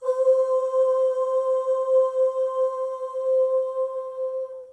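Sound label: voice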